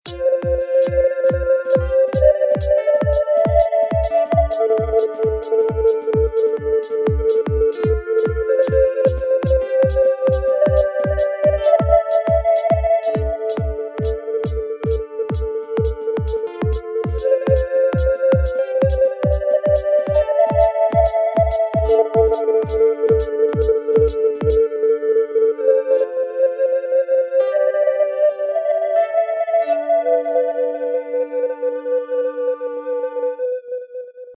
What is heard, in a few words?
Water Flute